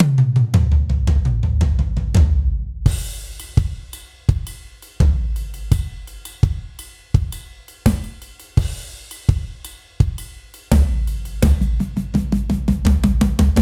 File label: gospel drum only; gospel drum kit ii; polarity audio